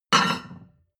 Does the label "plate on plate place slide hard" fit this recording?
Yes